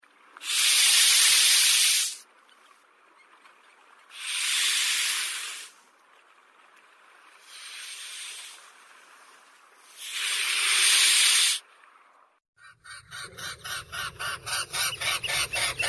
lebed shypit

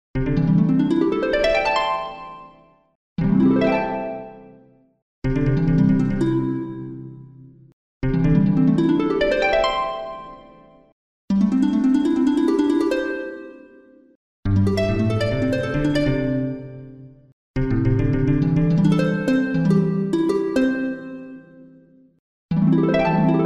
zvuk arfy

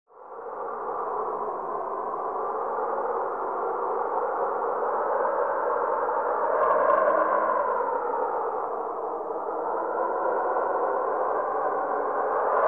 viuga

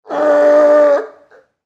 morskoj lev

